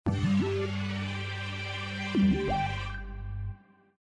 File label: Shut down